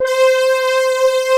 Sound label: OB STRINGS; Pro-Rec - Synthsonic; JV ANALOG2